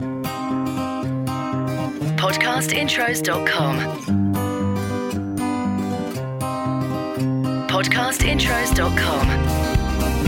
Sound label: ACOUSTIC; Podcast Watermark